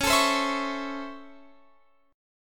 C sharp Minor Major 7th Sharp 5th C sharp Guitar Open F; C#mM7#5; strummed; x,20,21,19,19,20